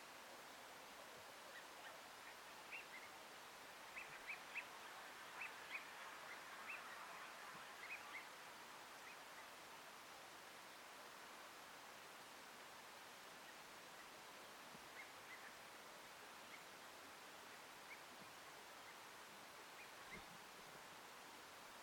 Eurasian wigeon; پرنده گیلار اوراسیایی

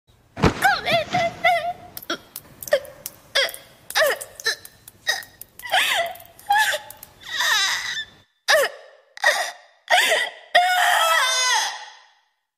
anime cry

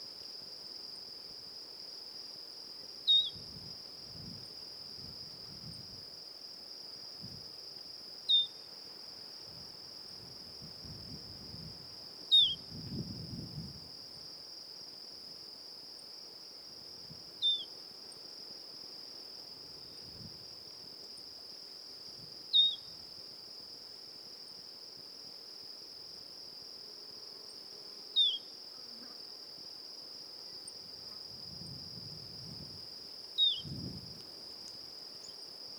Tropeiro seedeater, پرنده دانه خوار تروپیرو

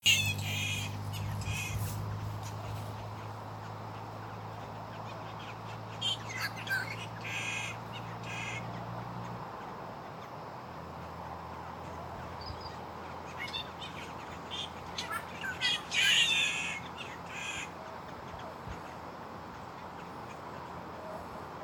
Spangled drongo; پرنده بوجانگای پولکی

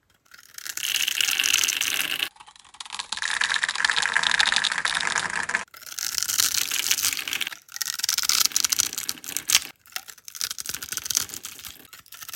soap asmr; خرد كردن و بریدن صابون

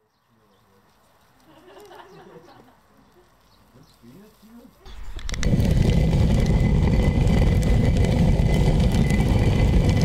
stone mill; آسیاب سنگی

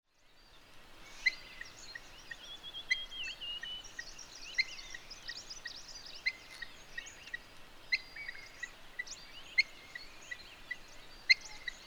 Himalayan monal; پرنده کاکلپری هیمالیا

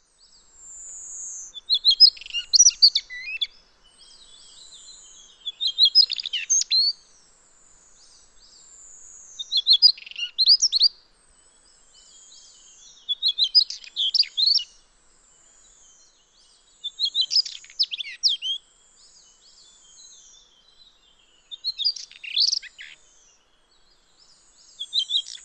Daurian redstart

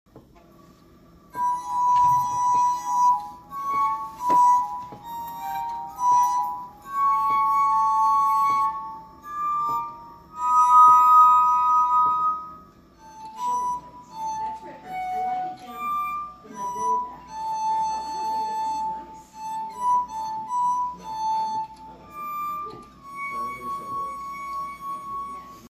glass harmonica

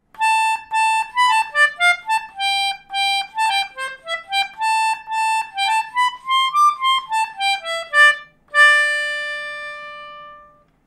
Melodica; ساز ملودیکا

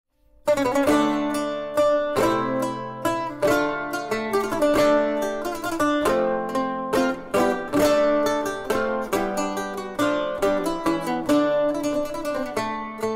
Lute

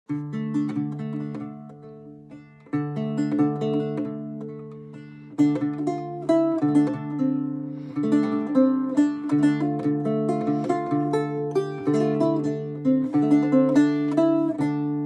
Laúd

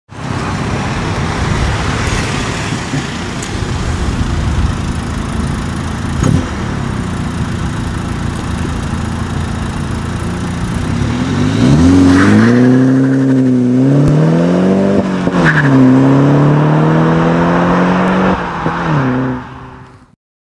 Cooper exhaust

